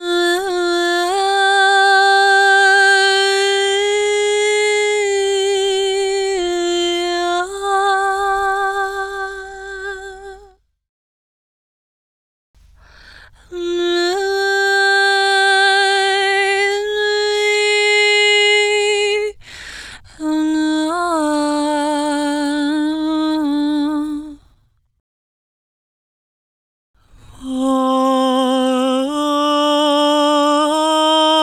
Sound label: Main Vocals - Cm 70bpm; Construction Kits; Ghosthack; SkyRealm; Kit 19 - Ode To Daenerys